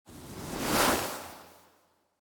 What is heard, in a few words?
swish